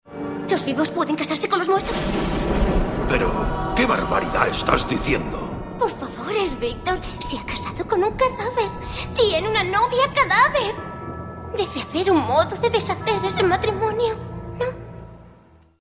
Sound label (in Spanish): sobrenatural; romanticismo; recursos